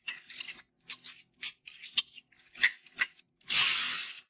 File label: revolver reload; Barotrauma; Barotraumatic